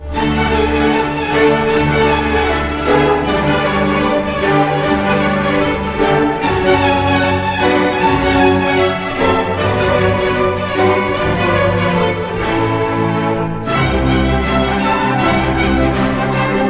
Romanian Folk Dances part VI VII Maruntel with Orchestra; Bartok